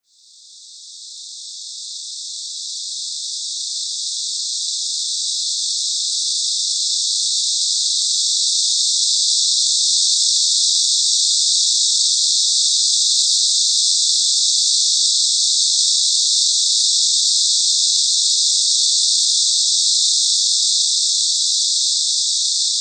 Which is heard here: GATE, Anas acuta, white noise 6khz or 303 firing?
white noise 6khz